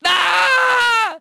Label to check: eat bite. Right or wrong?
Wrong